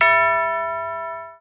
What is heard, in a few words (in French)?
SUCCES